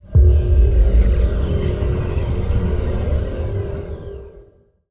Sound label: event psionic; Stellaris; utopia